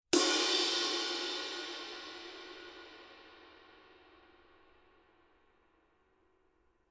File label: 20 thin body; paiste; masters